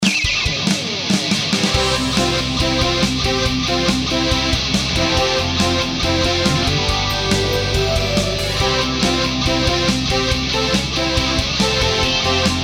Menu Rock N Racing; rock n racing off road dx

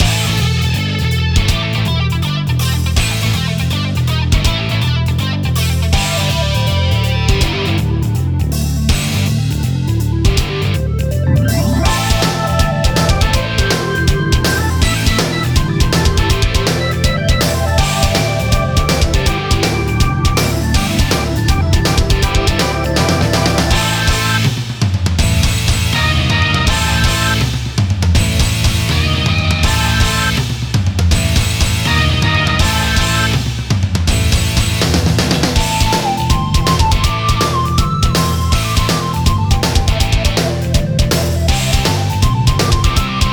boss loop low

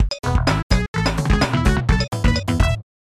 Level Start; Socks the Cat Rocks the Hill; SNES

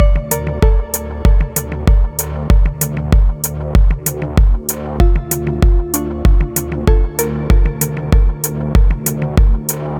loop; virush windows gamerip 2017; tunneler main

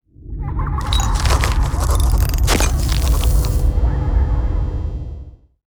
Logo; Beholder 2; Warm Lamp Games

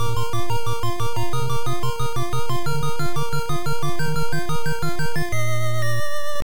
Player Goal; Tecmo Cup Soccer Game; NES

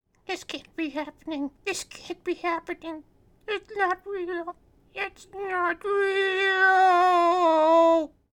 Male Reaction

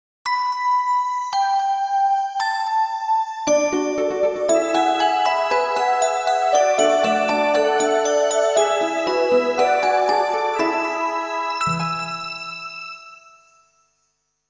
Angel Chime

